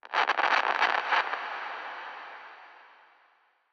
诡异森林点缀声; ambient

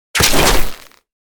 狼甲破裂声

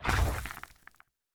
脚步声; 监守者

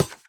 试炼刷怪笼踩踏声

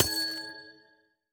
紫水晶簇破坏声

